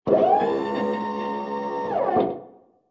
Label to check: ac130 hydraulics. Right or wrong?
Right